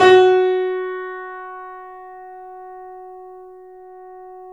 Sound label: F#, The Piano; InVision Interactive - The Piano